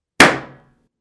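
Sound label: bursting balloon sound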